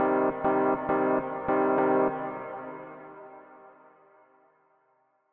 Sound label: Reverb